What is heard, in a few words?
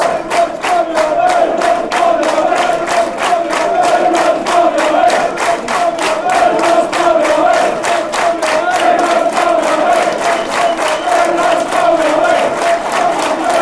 barmy army fanchants; brendan rodgers